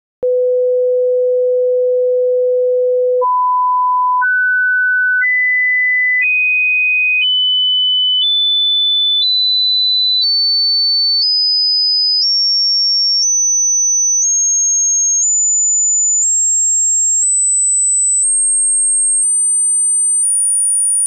The Harmonic Series, 500Hz fundamental, at -10dBFS (L+R); Stereophile; Stereophile Test CD 2